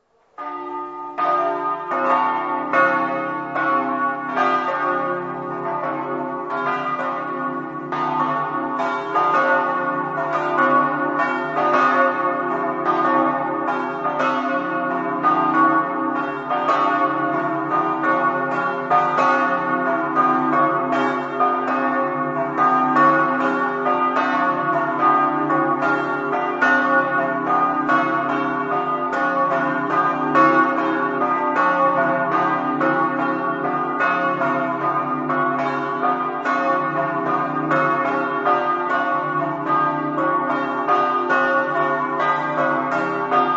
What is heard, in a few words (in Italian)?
buri, campane